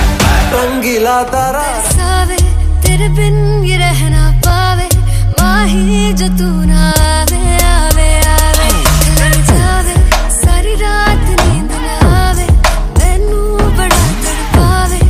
bengali ringtone

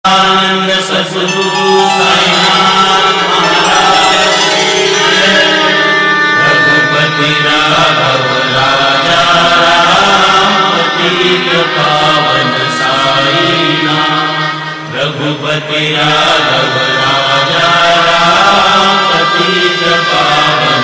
srilankan ringtone; sai baba

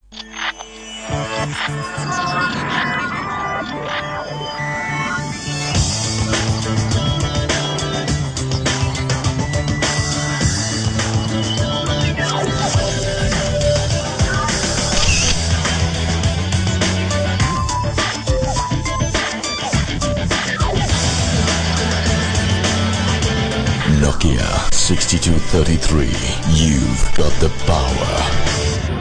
original ringtone; nokia 6233

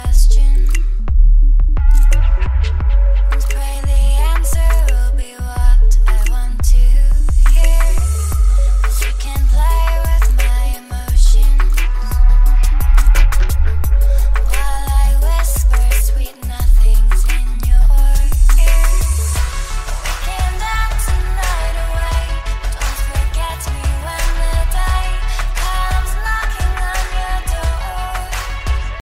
deep bass warning ringtone

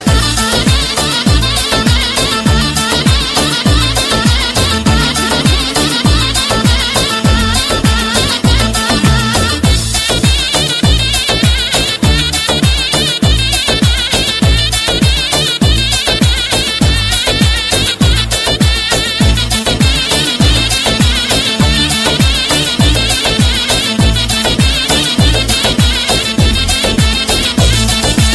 dj asia arabic ringtone

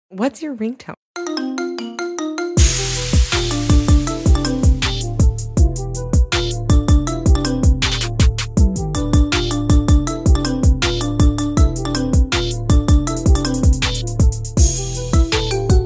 marimba remix ringtone; iphone

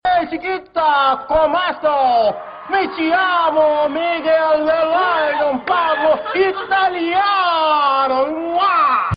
italian ring ringtone

